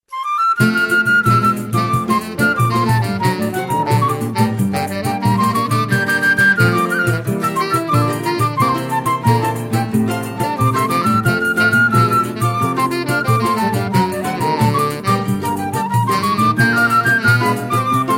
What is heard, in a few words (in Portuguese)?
flauta e sax